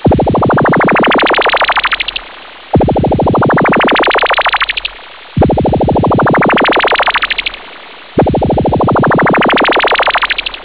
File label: martian gun